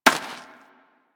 Rodent Hop Step Rear Dirt, Forest Rodent Footsteps; Characters; Foley; Warframe